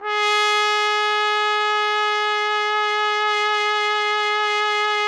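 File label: trumpet; Roland - LA Composer vol. 3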